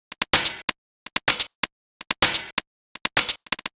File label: Hihat 127bpm, House Drumloops; 90 s Sample CDs; Zero-G House Fabrik Drumloops; ZG Drumloops